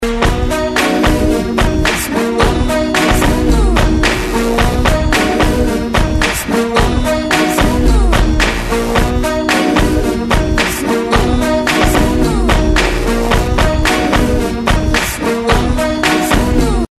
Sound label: with Whistles